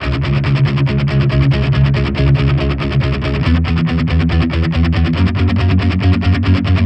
Distorted Guitar 140BPM C#min; Vengeance - Essential Clubsounds Vol.4

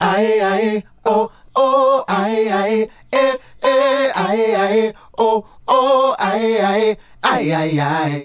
Male Group, Hip-Hop and RnB Vocals

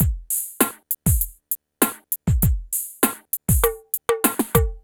PHATT BEA, Hip Hop; E-MU Formula 4000 Series Vol. 1; Hip Hop Nation; PhattBeats95-99

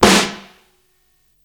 Snare Hard Punchy, Psychedelic Drums

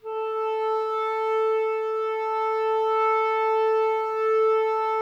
clarinets, Wood Winds

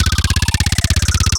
LOOPS, MIXED D LOOP, DANCE NOW

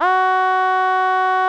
700 F#4, Korg; 700S; Back In Time Records; Korg Universe VOL-1

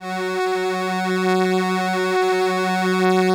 LAMBDA, String Machines

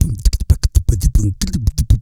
QUICK TONGUE, Vocal Blues Soul; Spectrasonics

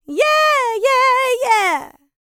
LICKS, Vocal R&B & Dance; ILIO; Vocal Planet

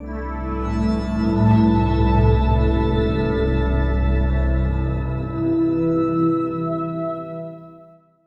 Guitar Textures

